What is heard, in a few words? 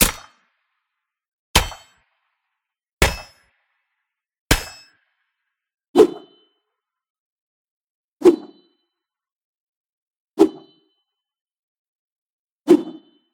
Pickaxe Tropical